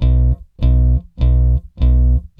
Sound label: BASS, ROCK POP; MAGIX